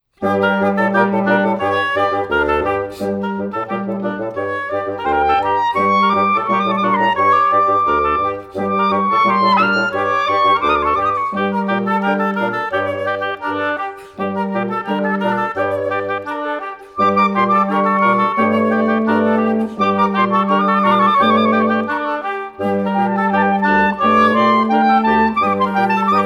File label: wind quintet klezmer style